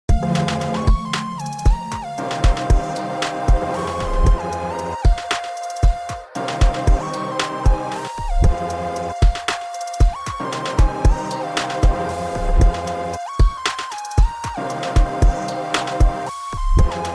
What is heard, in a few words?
Trap Beat 32 Bars